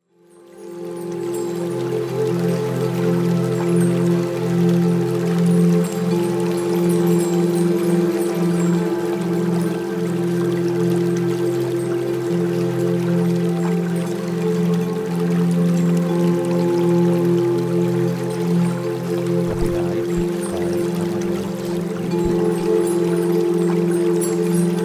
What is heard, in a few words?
peacemind relax